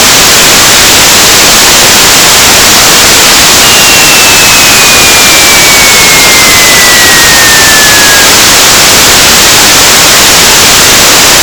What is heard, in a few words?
BPSK; Horyu-4; JE9PEL